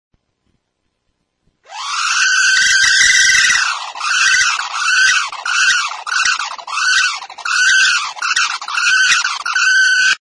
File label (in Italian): Toshiba – cuscinetti motore degradati, loud drilling, hard disk; toshiba 60gb